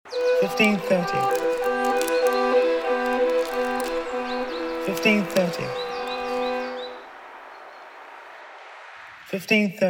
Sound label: Trees Strings 97bpm